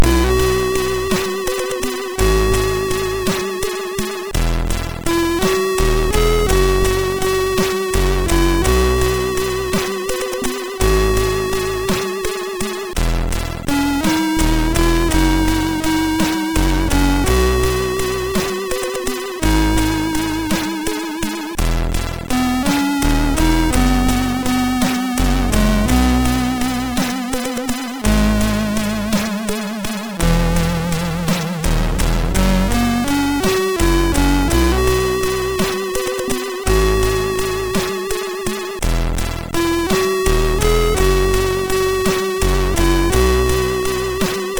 Jazz Club, sid, MOS6581R3

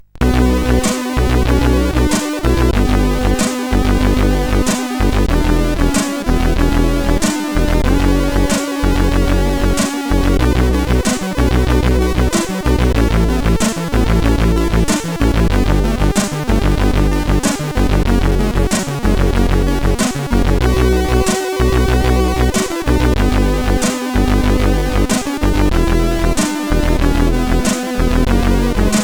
intro, sid CSG8580R5; Northern Lights

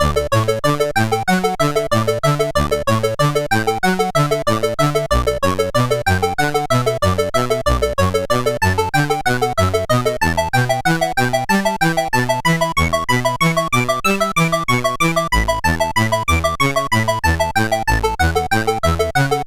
Synth Sample, CSG8580R5; Final Synth Sample II

